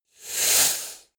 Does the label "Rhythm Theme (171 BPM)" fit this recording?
No